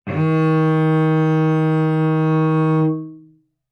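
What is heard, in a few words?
Cello arco fortissimo sul G Eb3; MIS Pitches - 2014; Strings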